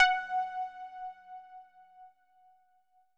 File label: F#4, SYNTH WAVES; Music Store Sound Service; Einstein